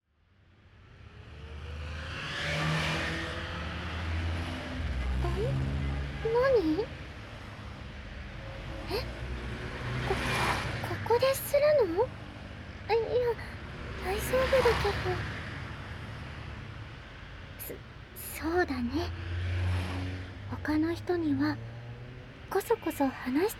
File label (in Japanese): 右耳フェラ／ねっとり／国道沿い; フェラ素材